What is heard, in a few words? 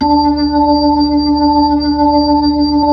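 B-3, Brass Chorus; Br. Ch. Xfade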